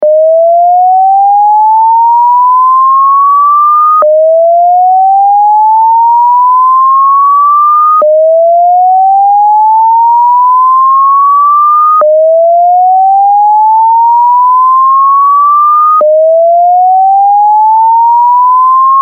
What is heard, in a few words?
Whoop